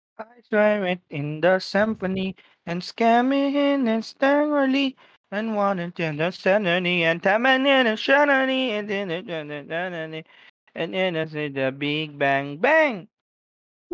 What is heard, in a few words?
smallest violin